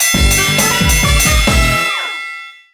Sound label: Boxing - Speed Bag - Finish; Wii Sports Club